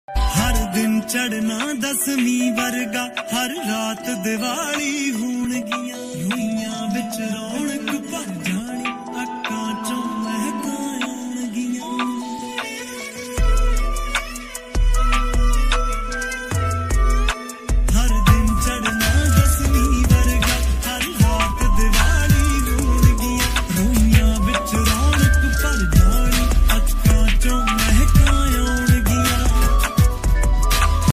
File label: ringtone; happy diwali; diwali special